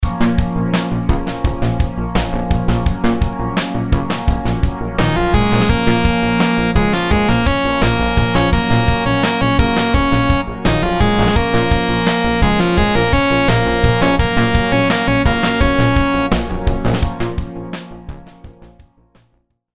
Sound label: rhythms simple dotted 8s 85